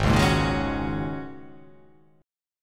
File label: G sharp 9th Suspended 4th G sharp